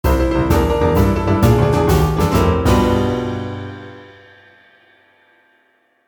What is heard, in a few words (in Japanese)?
アイキャッチ ブギウギ